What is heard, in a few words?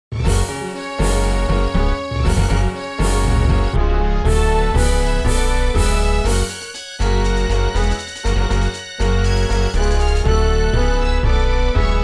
Character Selection; sol divide